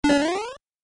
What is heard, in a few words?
jump super